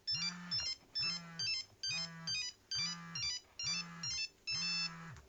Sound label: nokia 5510 ringtones hopping down